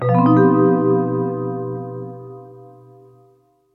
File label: Music Game Secret